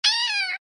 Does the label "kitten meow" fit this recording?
Yes